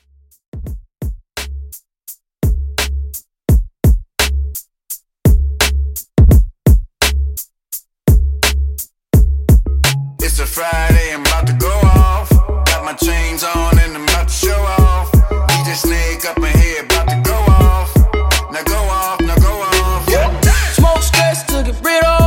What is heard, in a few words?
85 Bpm